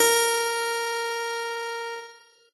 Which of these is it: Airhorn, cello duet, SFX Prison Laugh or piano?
piano